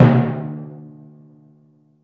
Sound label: long tom drum single hit c key